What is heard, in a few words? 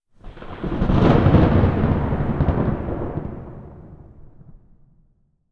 thunderclap